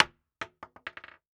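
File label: Weapon Bullet Shell 12 gauge Dropping On Hollow Wood; AdobeCC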